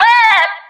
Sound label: "ah", SUNG